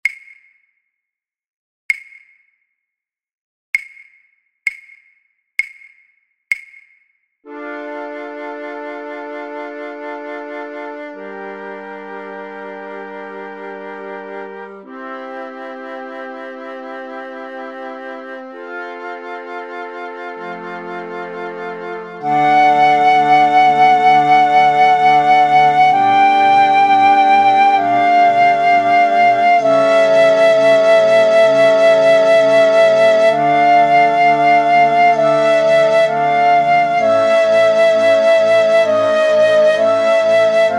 main theme flute choir piccolo

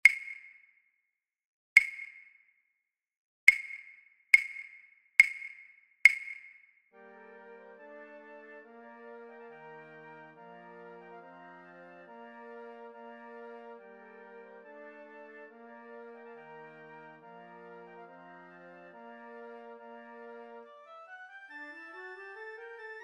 piccolo

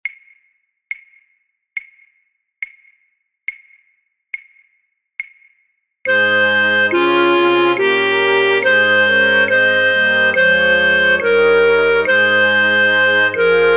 flute choir, Fluit 5A, Fluit 5B; voor oefentracks; Matthaus

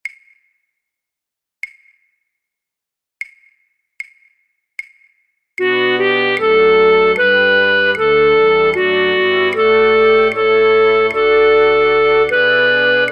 flute choir, Fluit 5A, Fluit 5B; voor oefentracks; Matthaus

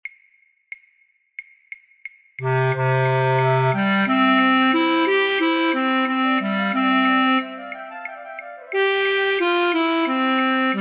flute choir basfluit; voor-oefentracks; Marche pour la Danse; Rameau; Platee

